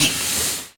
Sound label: gate; HeavenStudioPlus; shootEmUp